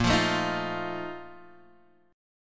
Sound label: Bb Major 7th Suspended 4th, Guitar, Half Step Down